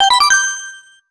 Save Block, The sound effect played when striking a; PMTTYD